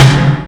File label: Snare, Drums